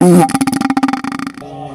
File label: farts